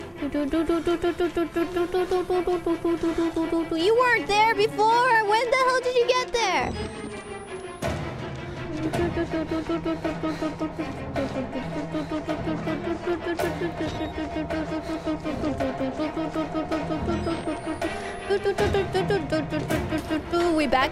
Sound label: dududududududdu